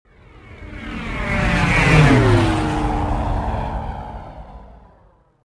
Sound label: traffic by